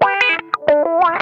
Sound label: CROAK LICK, Funk Guitar; Zero G - Funk Guitar